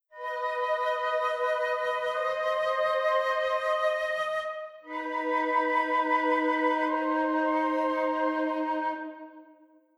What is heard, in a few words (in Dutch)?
dissonant oplossing